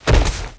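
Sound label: body hit; Total Chaos Nukem 3d Pack; duke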